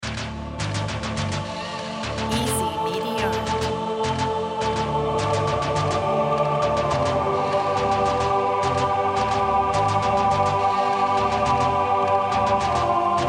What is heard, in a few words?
industrial